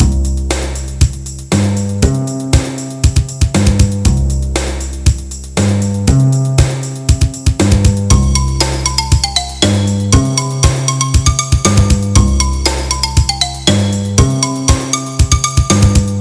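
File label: percussion loop; avr loop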